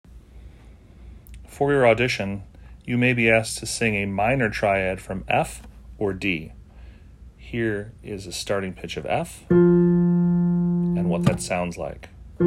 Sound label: Minor Triad starting on F and D